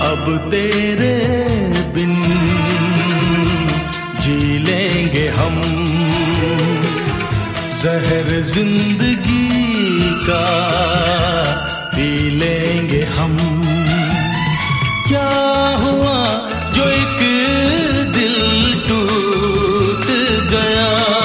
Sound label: Breakup Song Ringtone